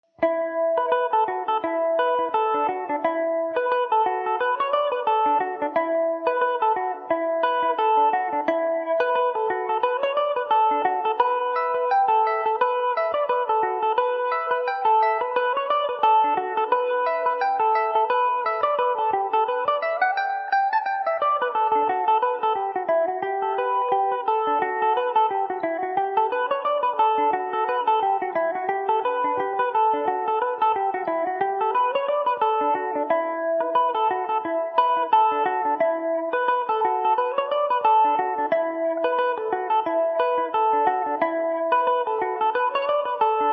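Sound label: E dorian, Irish mandolin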